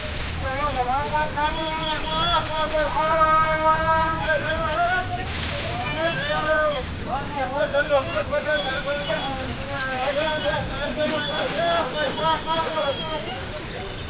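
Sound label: chennai kasimedu fish market, coastal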